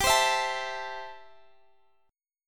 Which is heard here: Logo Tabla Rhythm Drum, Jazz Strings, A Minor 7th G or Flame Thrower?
A Minor 7th G